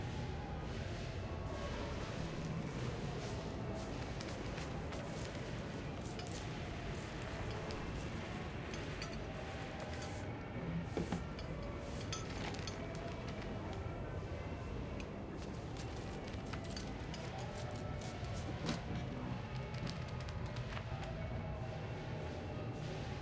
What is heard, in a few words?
amb penthouse; tft